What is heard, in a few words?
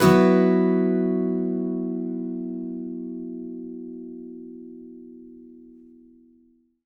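acoustic guitar B sus up, Whole Lotta Country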